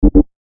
signal

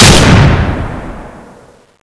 shoot

